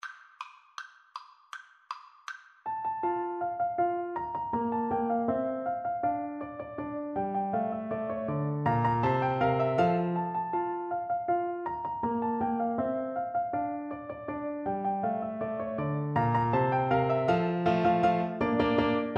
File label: Mozart Musical Joke FL kar, Flute